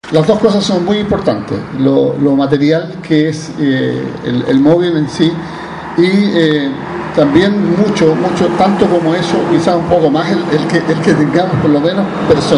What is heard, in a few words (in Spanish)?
ambulancia; Nueva ambulancia es entregada al hospital para el servicio de la comunidad